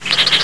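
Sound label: squeak, rat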